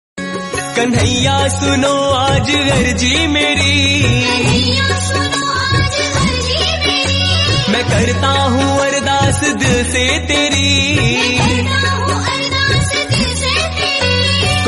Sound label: Kanhaiya Suno Aaj Arji Meri Devotional Hindi Ringtone